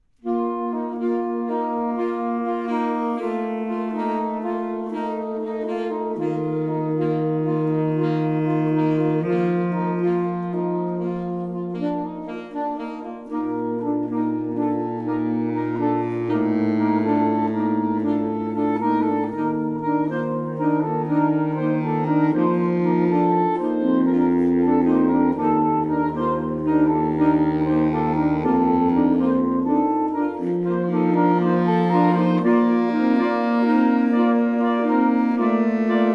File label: Saxophone Quartet, Sarabande, Lilting dancing; III. Sarabande